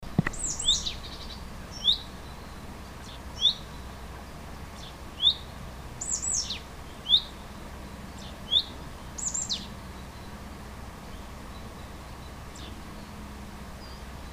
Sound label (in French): mesanges nonnettes